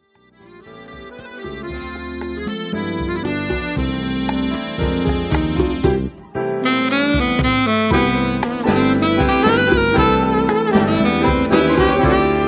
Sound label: RUMBA